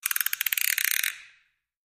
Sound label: Thumb Screw Turns, gears